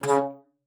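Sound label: C#3, bass flute; Xsample VOL-2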